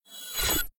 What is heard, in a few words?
Force Field Off